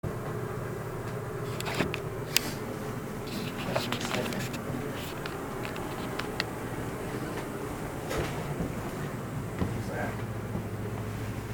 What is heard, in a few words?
Bar door noise; EVP